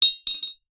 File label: household ring drop into glass jar